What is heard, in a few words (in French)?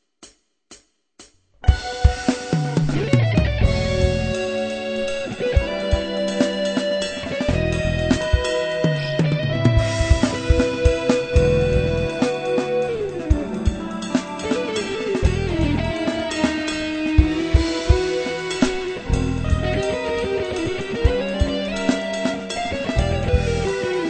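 Style jazz & fusion; guitare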